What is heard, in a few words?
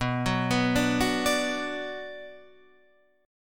B Minor B; arpeggiated; JGuitar